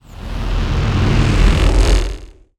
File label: transition, Cinematic Dark Sound Effects; BlueZone